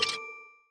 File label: increment stop; Sheriff Of Nottingham